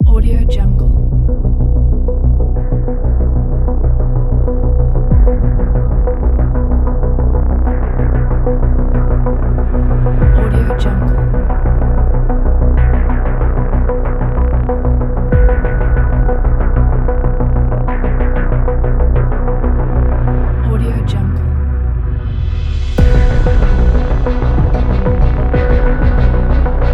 tension

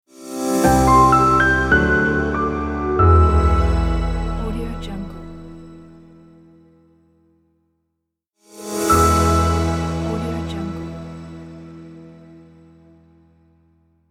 logo intro

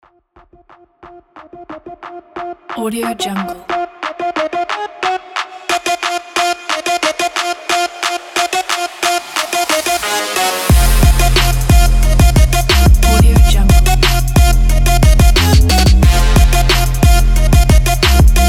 trap music

